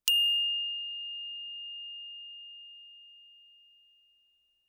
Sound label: bells brass ff F7; Percussion